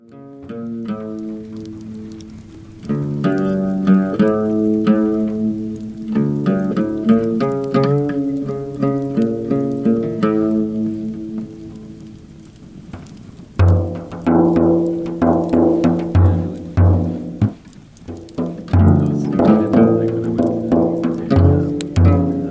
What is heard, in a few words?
Oud And Tar